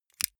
lighter electric switch flame on gas

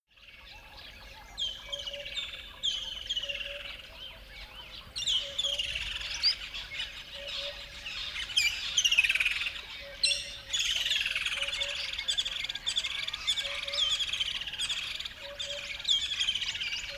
river dawn birds fight

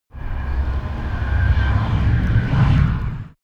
train train pass

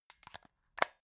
plastic remote battery compartment close

